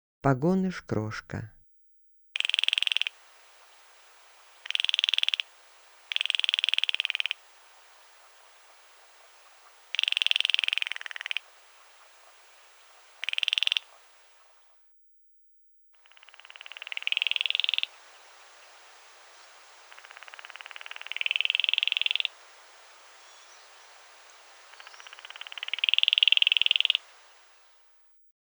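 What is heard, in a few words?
pogonysh golos, spotted crake voice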